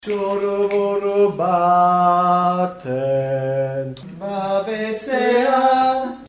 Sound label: basses